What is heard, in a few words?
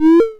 teleporter